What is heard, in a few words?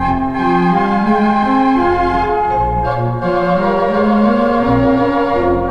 keys, Sad Loops